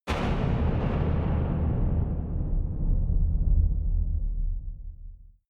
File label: massive metal impact; impacts